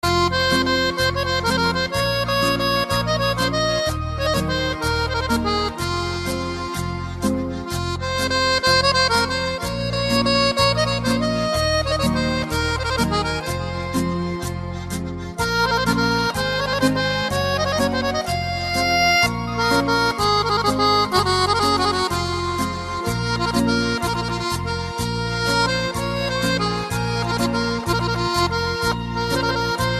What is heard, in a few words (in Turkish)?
Cerkez Muzigi